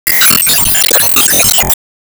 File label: Enemy Iceberg Crystal Attack Motion